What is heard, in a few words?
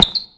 shoe; VirtuaTennis